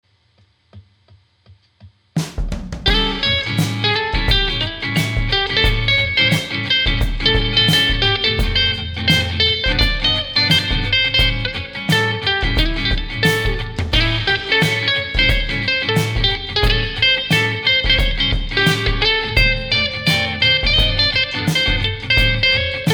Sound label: Bluesy